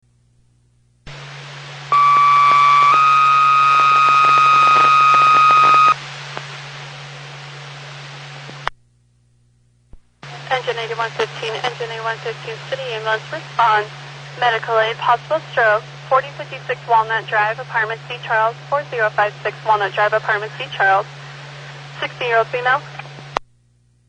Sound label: shots fired bridge; Martins ferry bridge